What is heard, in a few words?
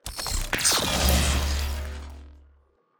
equip, sword; bane